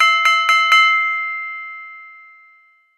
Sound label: gong